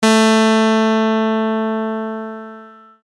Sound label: clav